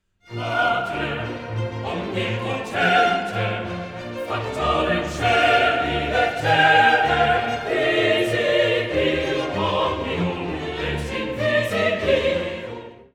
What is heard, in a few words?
Credo Patrem omnipotentem